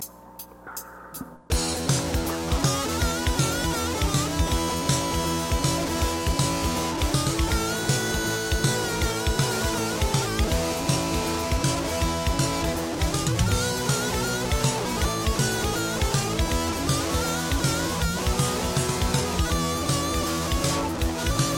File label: Blues Boogie